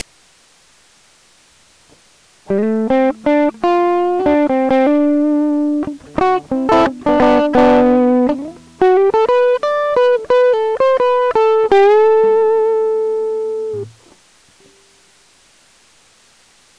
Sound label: recording clean